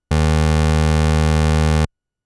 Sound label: Pulse A D#1, keys; fleet keys Samples